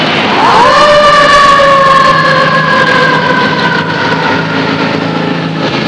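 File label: fire engine, transportation; Web Clip Empire 150,000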